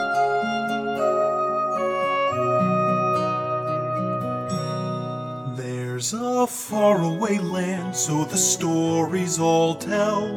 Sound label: vocal